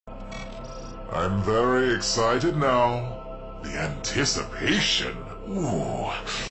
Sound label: very excited